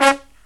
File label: LOW HIT, Brass